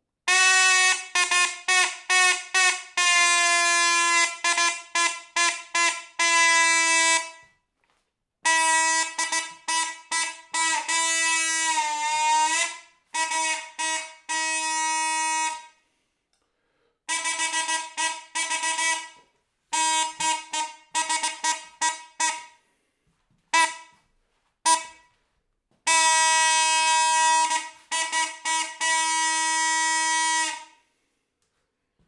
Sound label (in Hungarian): 45 eves trombita, szilveszteri; perkupa